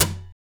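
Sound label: scrape, guitars; Spectrasonics; Hans Zimmer Guitars VOL-1; TAKA FX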